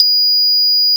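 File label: Troubadour Harp A#3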